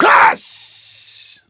Male Voice, African Voices

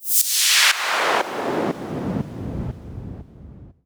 Sweeps Down

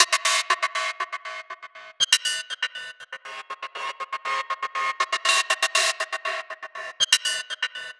High Hat, Drum Loop; Hell Bent High Hat; Sonic Foundry (Sony Creative Software); The Electronic Music Manuscript Collection; Drum Loop Structures

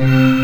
SFX, FILM SCORE; AKAI S6000 CD-ROM - Volume 1

